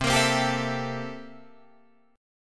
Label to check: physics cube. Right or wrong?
Wrong